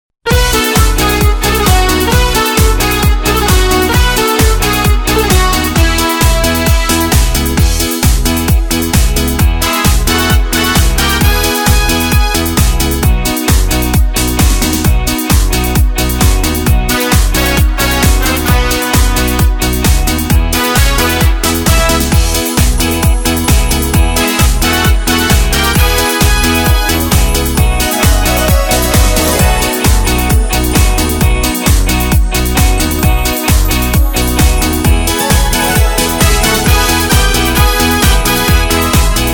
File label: Korg Pa3X